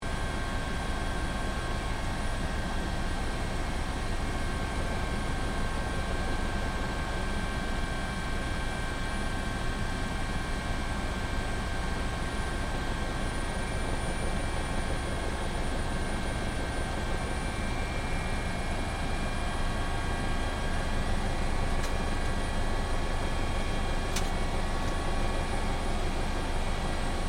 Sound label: Helicopter In Flight, Aircraft, Helicopter, In, Flight, Sequence, Open, Close, Door, Interior, Perspective, Hh-65, Dolphin, Loop; Transportation; BlastWave